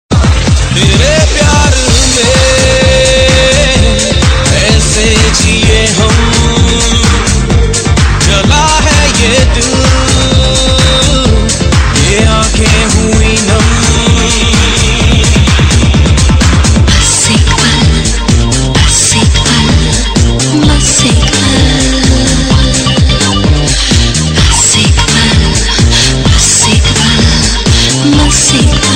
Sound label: Remix